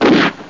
SHOT STAR; cash fruit

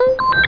fluty; Know Your Stuff: Passing Your Examinations

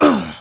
hero hit; alienbreed3d2

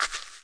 hit sand; jn4demo

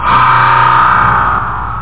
Alert; Now Pop Quiz

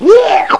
Black Hole In; Crystal Crazy Demo

